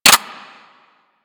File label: M16 Rifle Mech End; Grineer